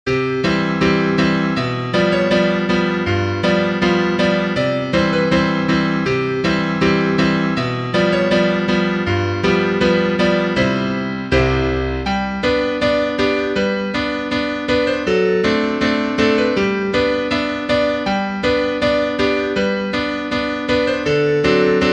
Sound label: piano, sheet music